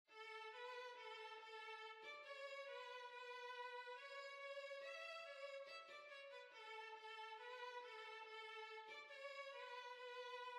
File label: Reel, Violin